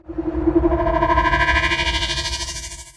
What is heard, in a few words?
zap